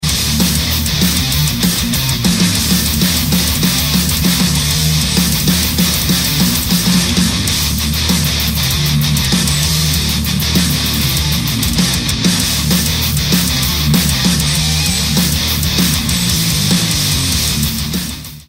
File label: Death Metal